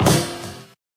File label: metal grate, footsteps